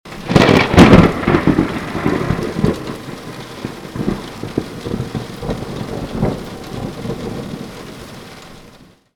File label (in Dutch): Onweer